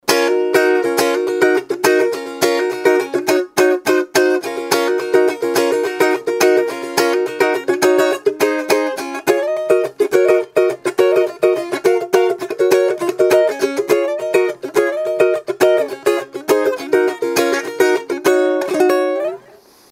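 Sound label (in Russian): igra na balalaike, playing the balalaika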